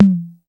KPR HI TOM, Korg KPR77

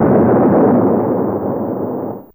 Bomb, Fairlight CMI Effects

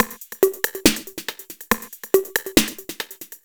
Break Pattern No kick, Drums

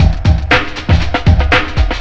FILTER, Big Beat Drumloops

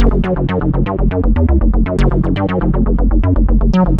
F#1, Synth Line; 90 s Sample CDs; NiceBeats; SynthLine VOL-18

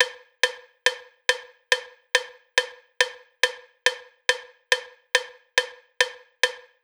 drum Loop 140BPM Cowbell; Vengeance - Essential Clubsounds Vol.5; VEC5 Drumloops; VEC5 Multi Loops 140BPM

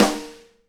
SNARE RING, Live Snares; PlatinumPhattCD1; E-MU Producer Series Vol. 8 – Platinum Phatt

